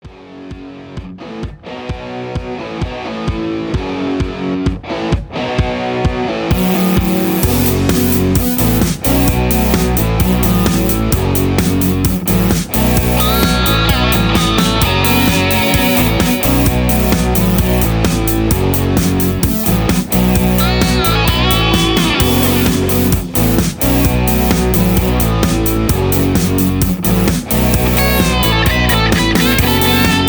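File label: Harder Rock Mix No background vocals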